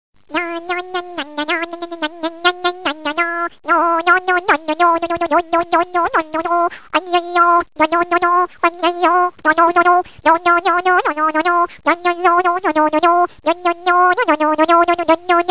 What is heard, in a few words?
funny annoying sound ringtone